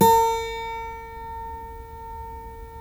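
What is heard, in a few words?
GUILD 12-string, Guitars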